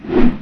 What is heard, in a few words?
attack; ancient mimic